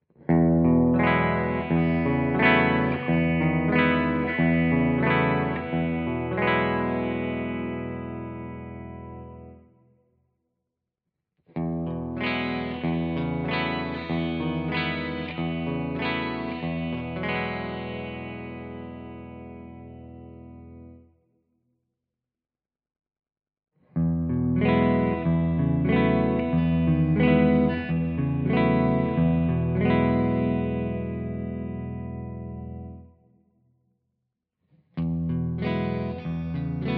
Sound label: All positions clean Chords; DAngelico